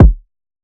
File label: Fire Kick D; trap; Cymatics; Trap Starter Pack